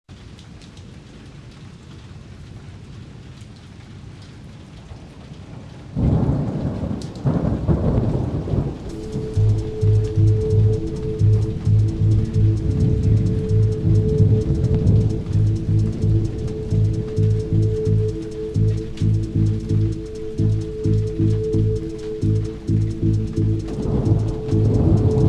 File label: rain